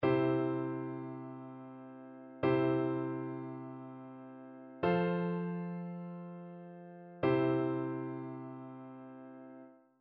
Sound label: harmonic dictation; aural skills i